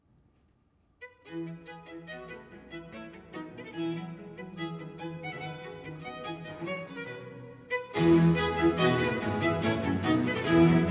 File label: Concerto Grosso in F major; Corelli